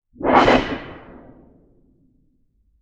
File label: Metal; TOOLKIT vol.2